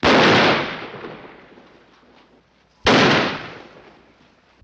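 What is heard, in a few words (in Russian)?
лопнула автомобильная шина очень острый как пистолет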